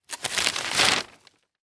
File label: Household Newspaper Page Turn